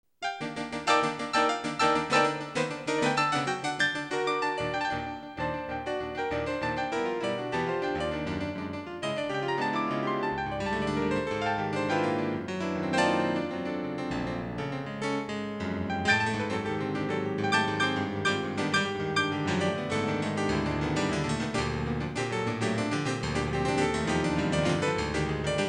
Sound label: weep piano